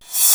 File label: Hat Reverse, Alesis SR-16, Cymbals; Drum Samples